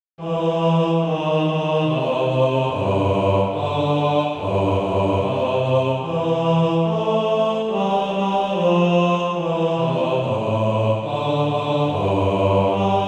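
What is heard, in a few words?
bass, Practise Music; Dare to Do Right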